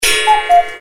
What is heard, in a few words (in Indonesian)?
alarm burung jam dinding